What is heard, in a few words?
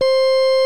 60's Organ